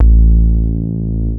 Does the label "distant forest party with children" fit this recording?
No